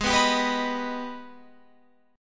G sharp Minor Major 7th G sharp down Guitar Open F; G#mM7 strummed